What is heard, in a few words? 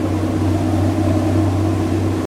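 Ambient troop transport ship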